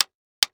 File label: Wood Hockey Stick Hit Cement Floor; Sports